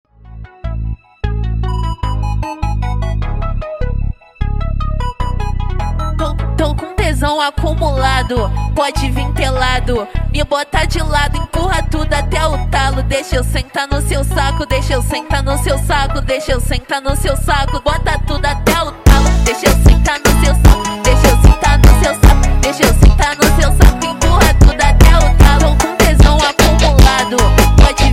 Brega slowed, funk

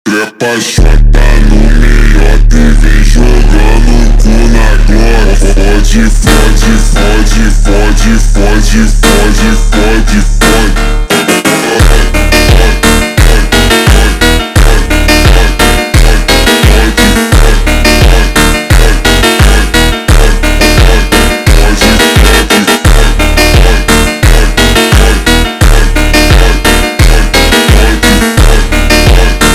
Slowed, funk

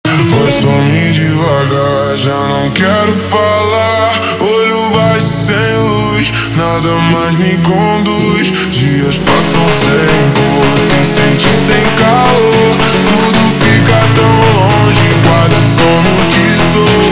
slowed, funk

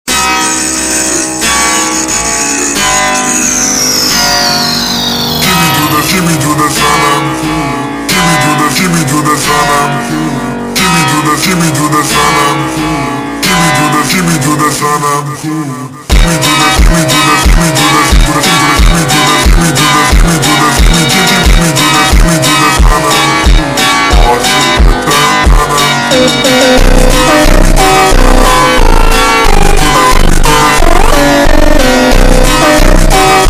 Funk super Slowed, funk; فانک ایرانی

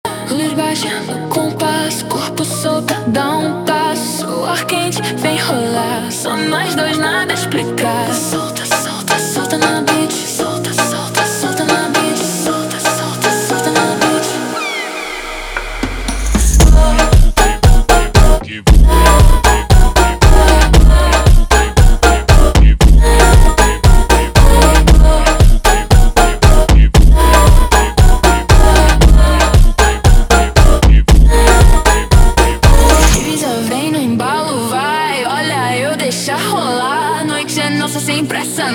slowed, funk

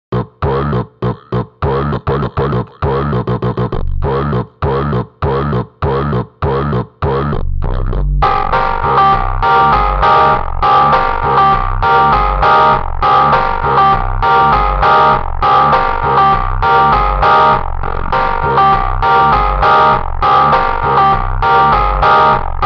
Montagem ultra Slowed; فانک

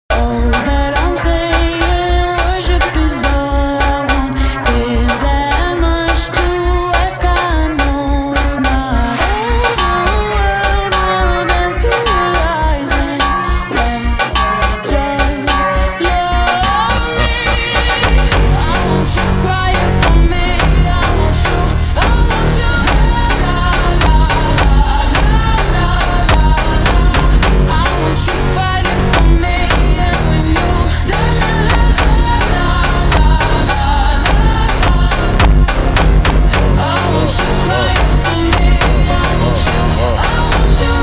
super Slowed; funk

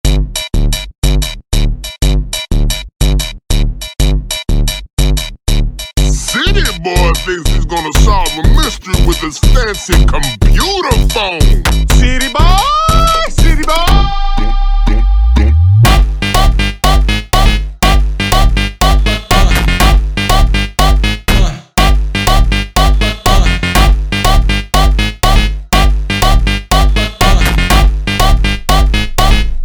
Jumpstyle super Slowed, funk

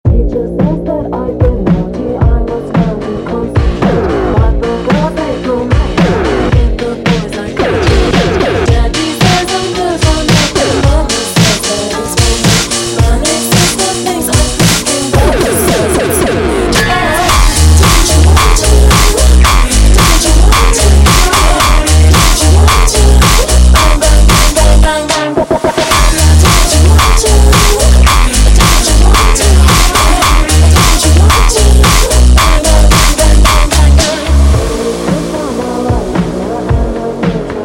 tekk slowed

